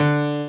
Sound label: piano